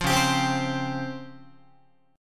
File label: Db Major Sharp 11th down Guitar; strummed; JGuitar